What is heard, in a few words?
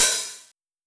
soft hit normal